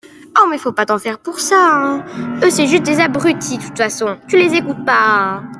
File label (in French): pleurs